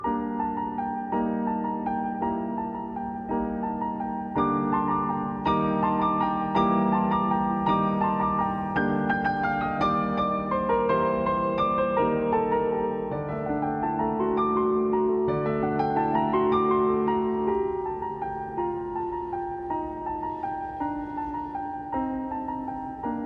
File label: carol of the bells piano ringtone